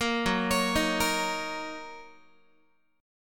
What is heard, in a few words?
Fsharp 6th down Guitar; F#6; 13,14,16,13,14,x; arpeggiated